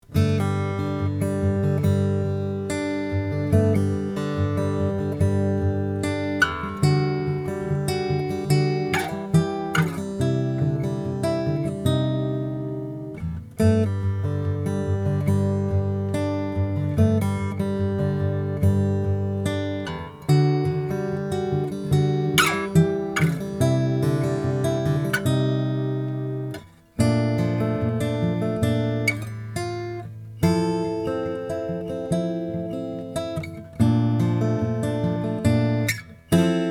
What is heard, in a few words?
2003 mcgill super ace african blackwood